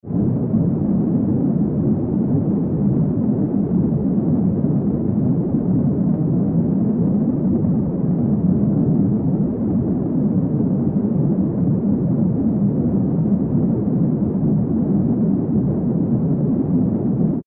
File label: underwater atmo